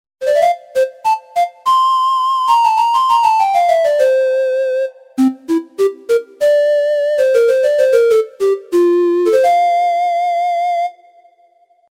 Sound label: panflute